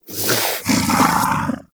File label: Predasite Vocal Breathe; Warframe; Hunting Critters